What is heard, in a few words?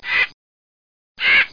crow; 2000 Sound Effects